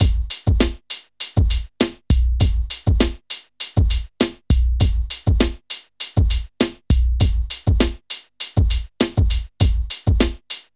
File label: HIPHOP, Funky Xtreams; INIS